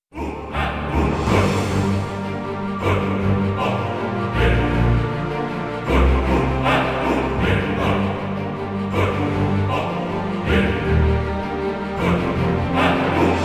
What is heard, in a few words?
level up song; Rogue Empire: Dungeon Crawler RPG